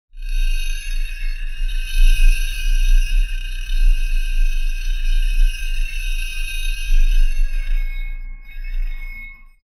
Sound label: grinding sound effect; undertones